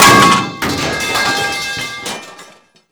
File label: metal pipe sound